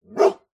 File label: animals dog medium sized single bark med close